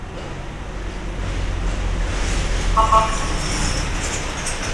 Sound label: Police Double Pulse